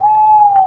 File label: owl level